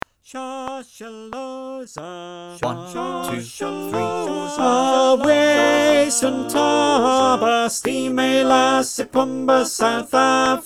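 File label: Tenor, click, choir; Shosholoza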